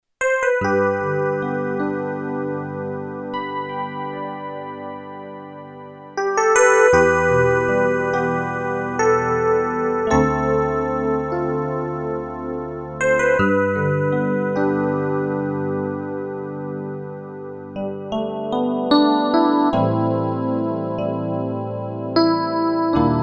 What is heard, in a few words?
E Piano; Korg B2